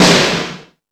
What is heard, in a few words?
All Snares, E-MU Pro-Cussion